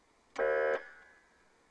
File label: alarm